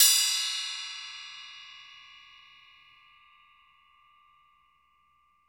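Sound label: metal cluster, metal hit; Spectrasonic Distorted Reality 2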